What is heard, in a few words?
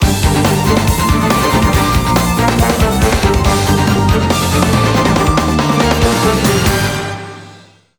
8bit BGM